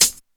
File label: muffled pedal hi hat sound f sharp key; hat samples